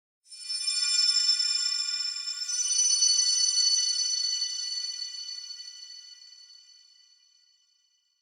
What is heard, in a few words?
High Baoding; Warframe; Clan Dojo; Dojo Randoms; Ambience